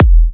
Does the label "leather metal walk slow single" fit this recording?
No